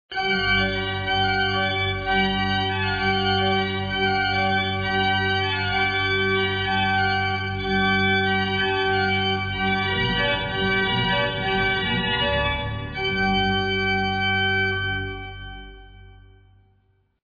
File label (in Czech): varhany